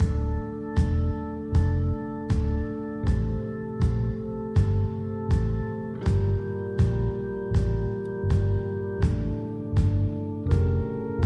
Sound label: keys, Sad